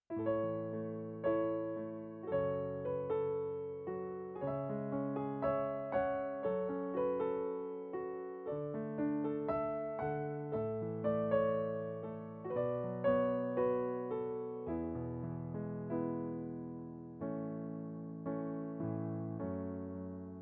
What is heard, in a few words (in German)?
Playback A-Dur